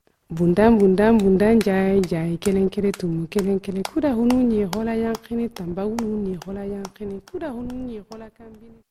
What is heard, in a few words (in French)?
Comptine Bunda Bunda SONINKE